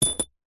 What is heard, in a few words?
industrial metal screw or bolt drop on another on carpet